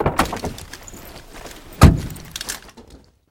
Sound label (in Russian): gromkij neterpelivij razbor hlama v yashike stola